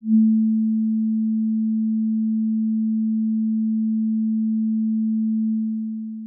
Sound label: Sine with Long Attack and Release